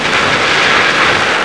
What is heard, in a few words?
Dirt; Racing Days R DEMO